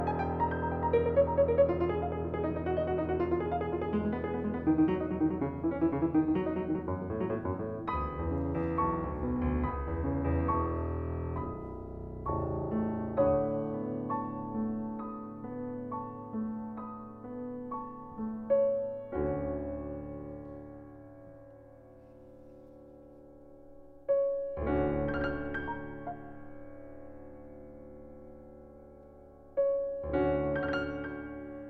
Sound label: Ballad